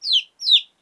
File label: chick